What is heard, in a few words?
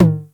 TR909 TOM; East West - Global Sounds